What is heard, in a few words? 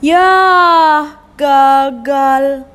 fail